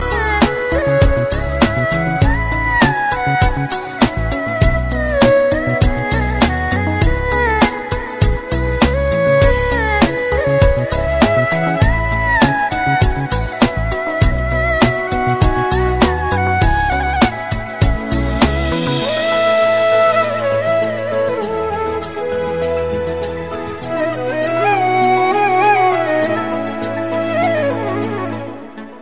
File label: Sweet Soft Love